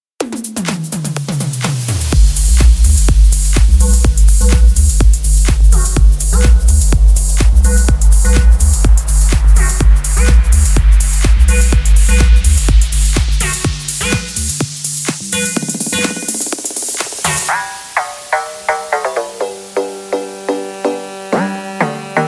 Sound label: DJ Live